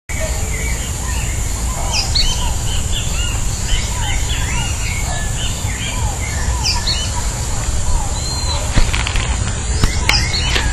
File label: Synallaxis frontalis; Itaparica; Jorge La Grotteria